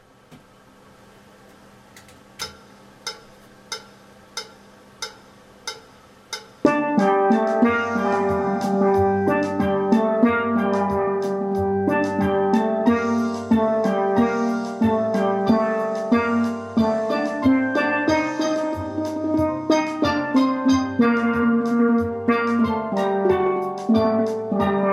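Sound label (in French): ALTO Harmo 3 voix + basse 92, Steel Band; bella-ciao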